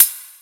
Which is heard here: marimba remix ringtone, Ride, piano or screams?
Ride